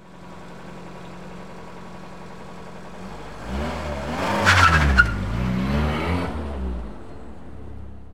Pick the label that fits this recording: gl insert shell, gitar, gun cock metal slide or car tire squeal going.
car tire squeal going